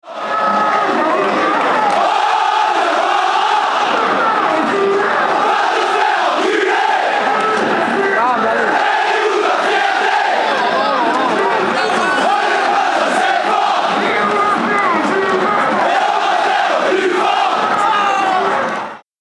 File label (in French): Hoo Diawara